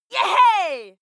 female shout yeah; menschen

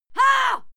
female fight scream hah

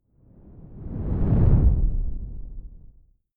whoosh simple low grainy

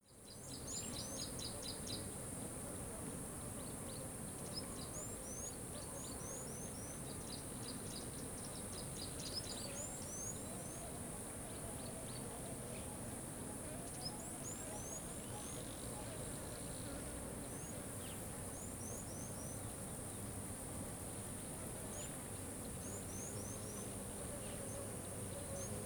river day idle

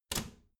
bathroom cabinet doors close soft; objekte; tueren

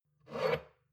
shot glass grab table slide medium; berufe; soundpool

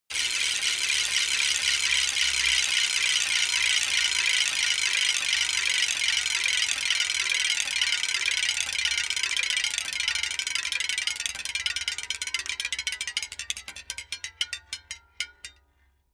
bicycle spin wheel spoke rattle high; fahrrad; verkehr transport

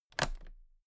toolbox latches close regular

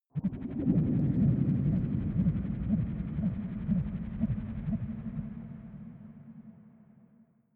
space alien spacecraft underwater drive big; soundpool

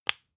spice jar small close

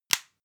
floss close hard; haus-garten; badezimmer

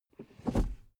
suv pull back gear straight soft slow; verkehr transport; autos

